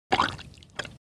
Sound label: mop